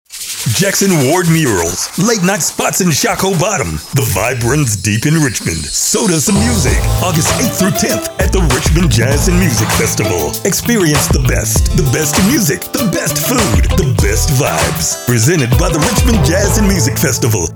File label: Jazz Hiphop